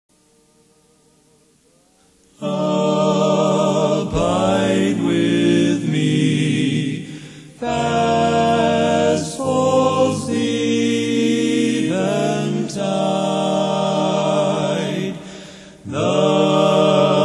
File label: Old Hymns A Capella